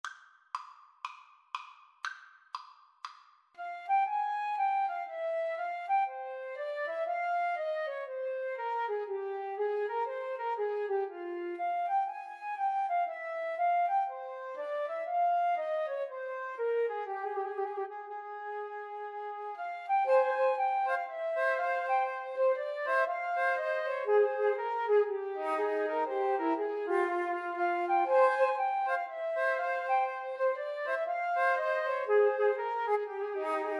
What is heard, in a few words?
bach bouree, Flute Trio